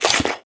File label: breath out, headcrab black; creatures